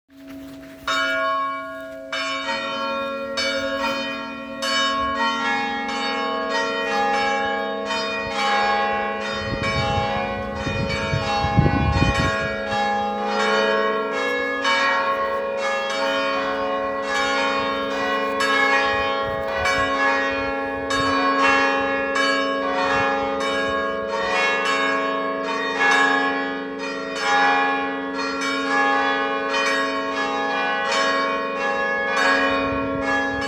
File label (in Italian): Campane domenica delle Palme